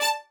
violin

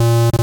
bass A#1

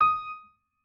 piano

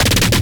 bass purge

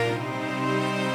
strings

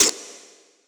vox

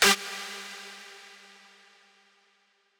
lead

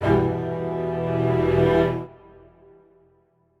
strings

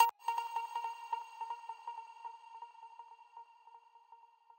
arp

